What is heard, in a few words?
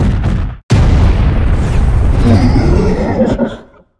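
death, monster; realtempus; thedead3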